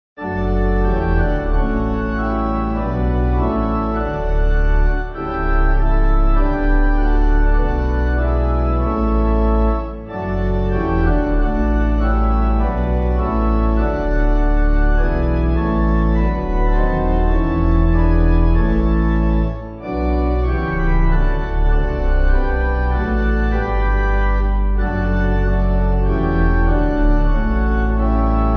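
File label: Pipe, Pipe Organ backing; Bread Of Heaven; Maclagan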